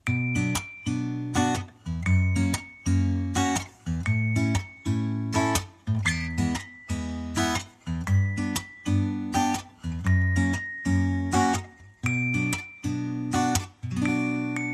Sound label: Downbeat